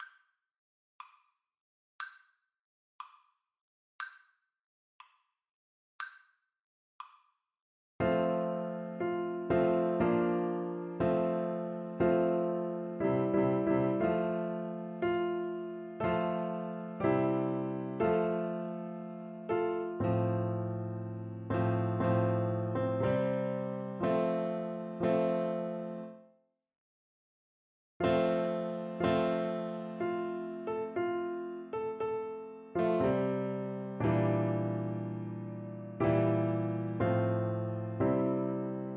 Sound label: anthem, Clarinet; chinese